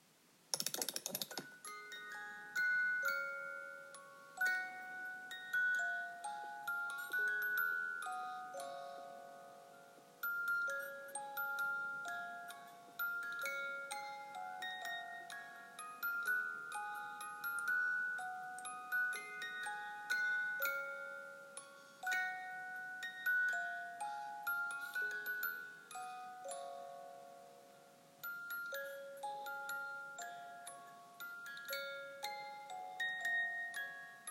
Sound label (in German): Guten Abend gut Nacht Spieluhr